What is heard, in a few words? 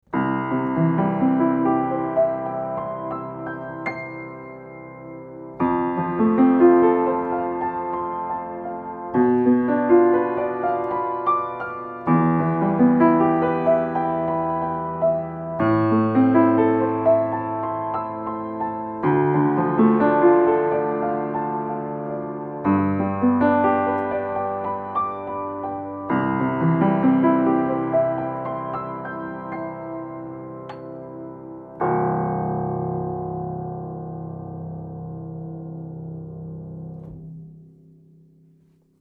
OC818 Piano MS Mid Dual Mono combined